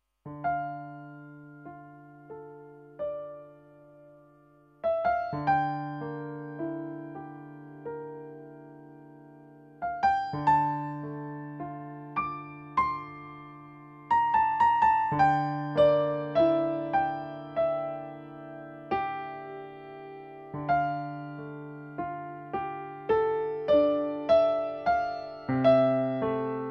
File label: Piano